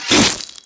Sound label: melee; physics